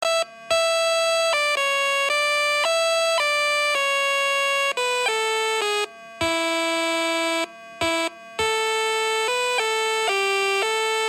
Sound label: Bagpipe sound